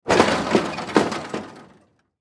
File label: Crash Debris Movement Metal Plastic; Debris (Sound Effects:Materials); Blastwave